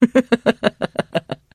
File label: trader laugh; 7D2D; 商人杰恩（七日杀）